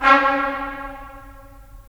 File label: BRS, Tps Stacc; Roland - LA Composer vol. 4